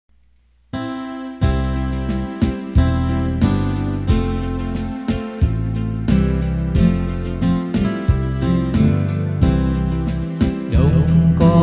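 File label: soprano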